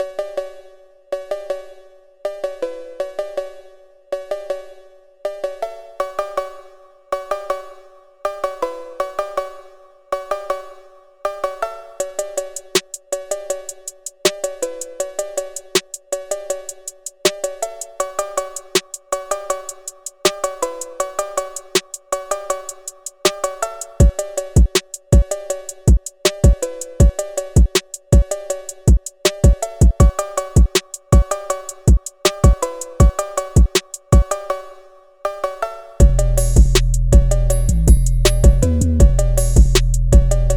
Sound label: Phonk